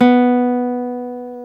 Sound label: GTR nylon; Club-50 - Foundations Roland; Nylon Martin